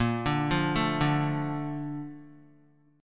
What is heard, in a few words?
Bb Major 7th, Guitar; BbM7 arpeggiated; JGuitar